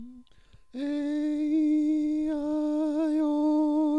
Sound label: aeiou High